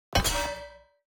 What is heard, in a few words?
environment battle; Shelter